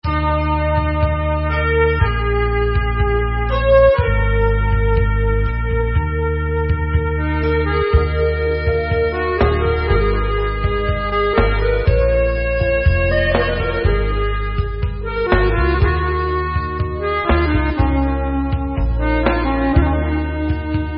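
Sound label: Instrumental, Israeli Dances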